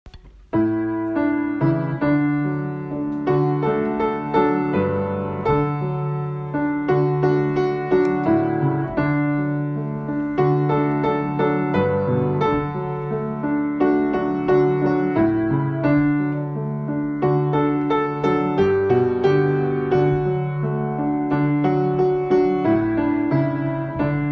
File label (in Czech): prislo jaro do vsi klavir; Puntika a Pepiny